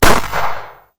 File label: Gunshot